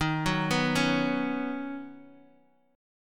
D sharp Minor 7th Sharp 5th D sharp, down, Bass Standard; D#m7#5 arpeggiated